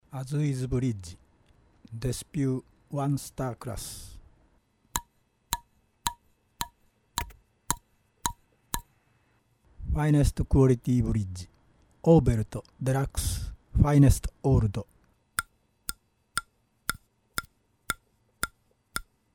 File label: bridge tapping, cello